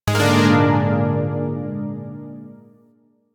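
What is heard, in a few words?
Shawzin Minor Chord; Zariman Shawzin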